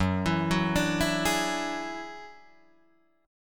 F sharp 7th Suspended 2nd Sharp 5th, Guitar, Drop D